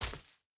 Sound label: footstep hide grass jump land; Rust